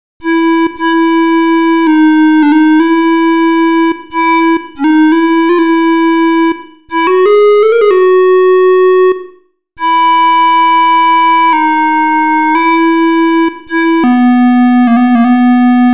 clarinet

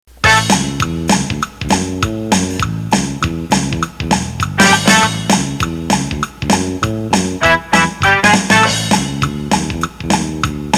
horn midi

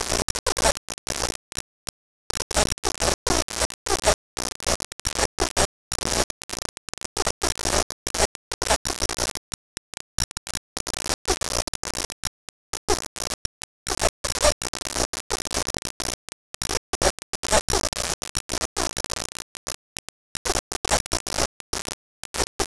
singing, sugarglider

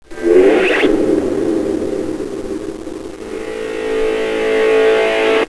riff

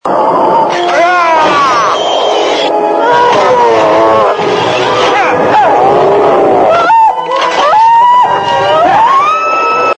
Argh; spidey 2

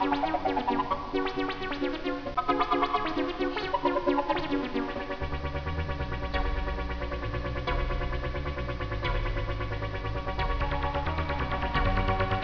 MOOG; vinyl

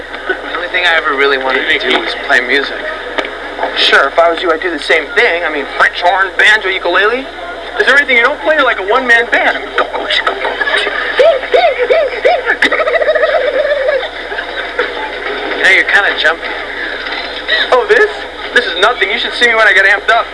one man band

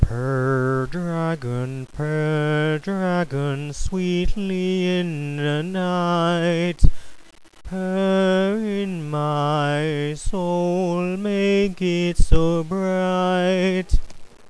Purr

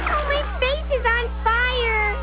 face fire

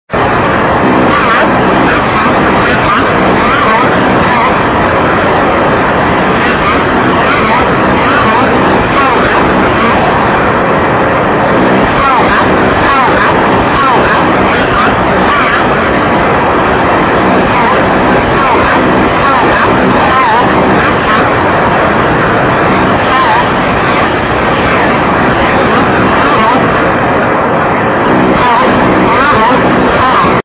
noise; V2a; This recording of V2a shows another typical variance in the transmission audio quality.

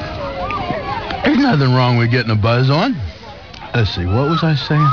buzz

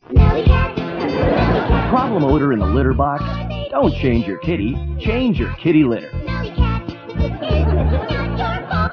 jingle

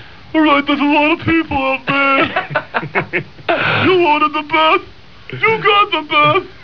kiss

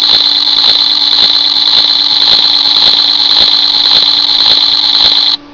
tank engine; megatron

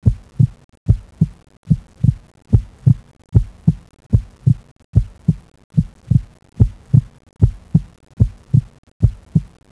heartbeat

